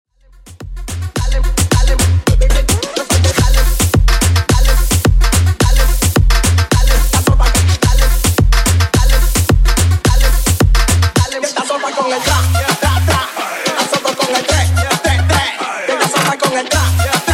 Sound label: Moombahton, 108bpm